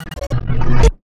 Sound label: gluk; fx; base pack